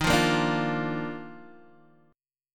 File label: Eb Major 7th Suspended 2nd, Guitar DADGAD; down